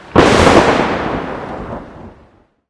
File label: lobby defeat lightning; smite; chaac